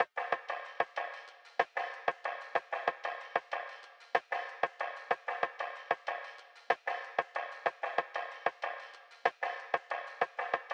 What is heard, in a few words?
94 BPM G# Min Perc; Melodies; Loop Stems; Cymatics; GHOST (Beta Pack); Freedom